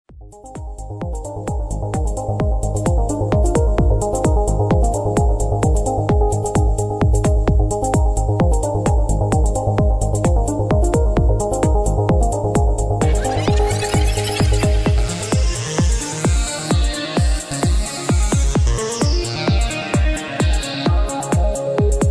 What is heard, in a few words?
party roll ringtone